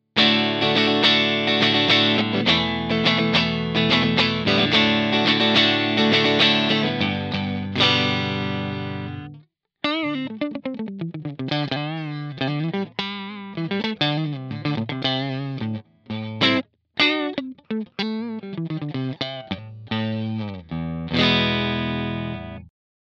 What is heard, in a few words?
clean